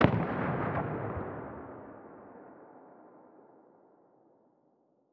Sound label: double shotgun attack far distant; Rust